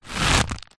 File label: rope stress tighten creak fast; foley; zapsplat